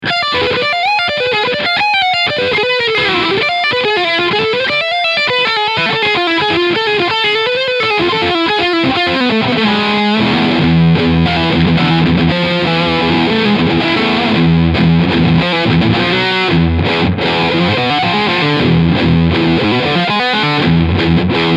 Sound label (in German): Schecter C-1 Apocalypse E-Gitarre Leadsound Steg PU; Red Reign